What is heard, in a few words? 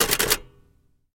Typewriter Back Spa; typewriters